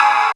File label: synth, Dance Synths Trancers